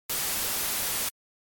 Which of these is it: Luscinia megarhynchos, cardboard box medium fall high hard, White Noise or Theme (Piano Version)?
White Noise